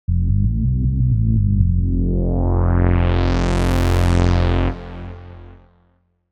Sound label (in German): bass sequenz house quence; rob papen subboombass testbericht